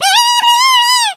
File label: squeak lead; brawl stars